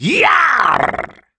taunt death; blackthorne

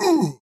enemy darksteel anvil death; sfx

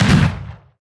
heroes broden basic attack impact

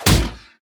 minotaur headbutt

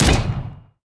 heroes death From Above attack explosion; onagro

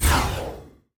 spiders hero range

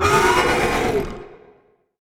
blessing of retribution cast; heroes; lumenir

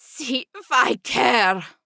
death; Lynn

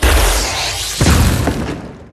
super ray descarga; spider goddess

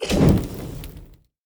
enemy lava evolved flame; sfx

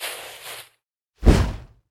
tree fiery nut throw

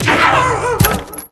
hyena death

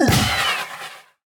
enemy brute welder death; sfx

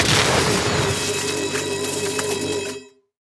tower eldrictch Channelers basic Attack cast

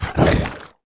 myrmidon bite

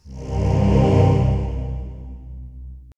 consecrate; hero; priest

